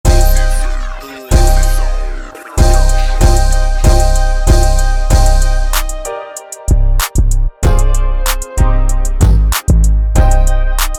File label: Instrumental